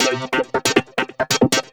pure synth, techno, 138, c; instrument-loops

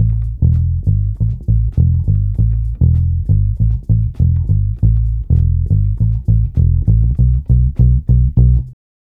Bass Loop, Ambient Grooves

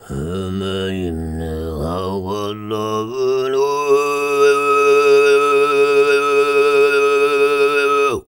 SOLO, TUVAN RASPY, World Voices; Spectrasonics Vocal Planet CD5

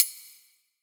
Kitchen Foley FX Drums

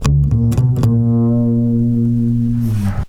Upright F, Basses; Total Drum&Bass

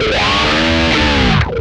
whammy fx, guitar

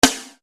EFF. SD, Yamaha RY-30

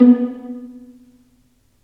Vas Pizz; STR Vlas Pizz

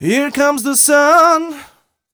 m 127 mel a, Vocals

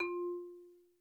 XYLOPHONE F4; Greytsounds VOL-1

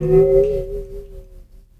EQ Perc, Percussion Mix

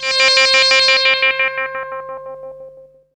EFFECT, Explosive Jungle; EFFECT 1; USB Soundscan vol.05; AKAI

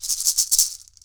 PUILI, HAWAII PERC; AKAI S6000 CD-ROM; WorldSounds

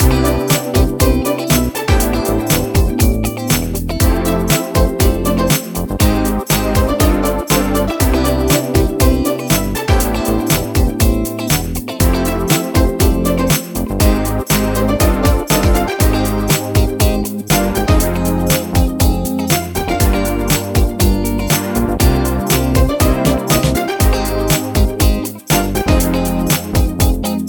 Full Mix, Nu Disco, C 120bpm; Zero-G; Nicengroovey C

